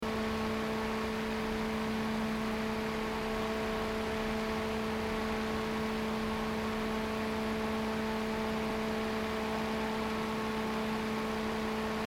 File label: globe 120sl 12v 77cfm 37dba